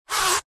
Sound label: foley zipper clothing or bag single fast movement; zapsplat